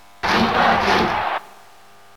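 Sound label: Cheer Japanese; Pikachu; SSB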